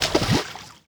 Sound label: water run medium